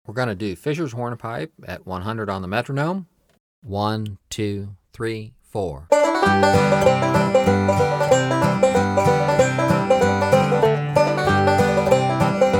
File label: Breaks @ 100 Bpm; Fishers Hornpipe